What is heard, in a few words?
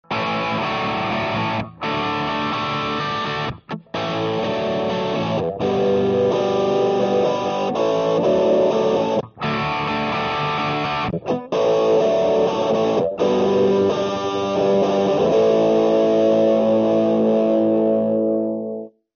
Boutiq Chrunch; GNX1